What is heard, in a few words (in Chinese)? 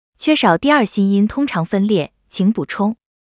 第二心音通常分裂; XinFeiTingZhen